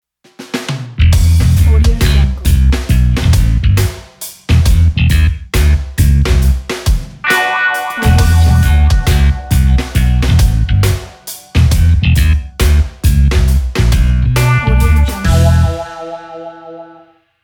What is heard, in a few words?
funk logo